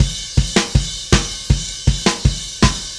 Happy Pub Beat, Indie Rock; Sonic Foundry (Sony Creative Software); Indie Rock Anthems; Session 05 - Happy Pub

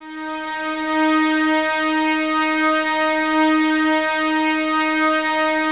violins D#4, string ensembles; Miroslav Vitous; 11 Violins

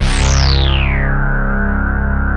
big rezo C2, rezo bass, bass analog & synth; USB Soundscan vol.12; AKAI

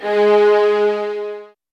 SOFT VIOLINS

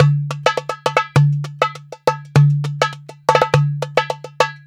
MEH1 PERC, Balkanish Traditions

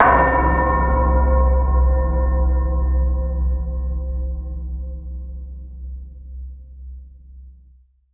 c1, Bell + Flute, MIRAGE; ProSamples vol.55 - Retro Sampler

